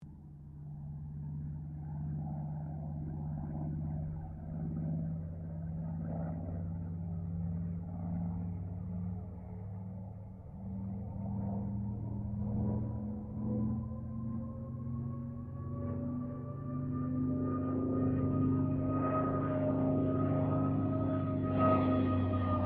AIRCRAFT CA-13 BOOMERANG PASS DISTANT; Airplanes

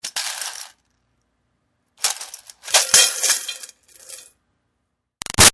Foley Coins Moves Metal Bucket Tip Over; Money; Objects; Big Room Sound

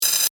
Static Buzz, Production, Element, Static, Buzz; Sound Design; BlastWave

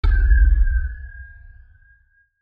Hit Deep Flange, Hit Deep Flange Feedback; Sound Design; Deep Tones; Blastwave

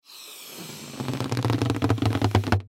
Balloon Blow Up Hand Friction; Balloons; Cartoons; Blastwave; Foley

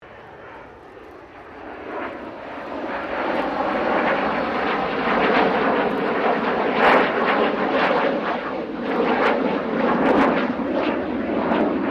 AIRCRAFT FA-18 HORNET PASS

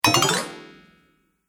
Metal Magic Up; Magic Sound Effects

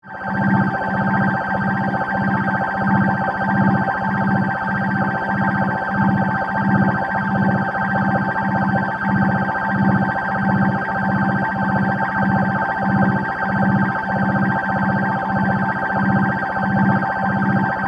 Alien Energy; Sci Fi; BlastWave

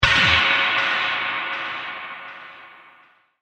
Metallic Space Impact

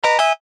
Chime Harmony; Chimes; Multimedia; Blastwave

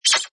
Blast Short Bright Burst; Scifi Production Elements, Set 2

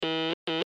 Morse Code N Letter; Blastwave

